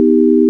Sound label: sine; 音の波形と分析